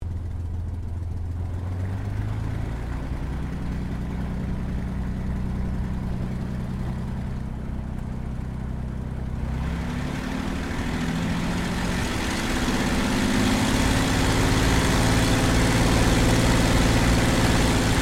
AIRCRAFT CAC CA-18 MUSTANG MK23 PREFLIGHT INTERIOR; CA-18 /P-51 Mustang; Airplanes; Vehicles; Aircraft Collection; Twistedtracks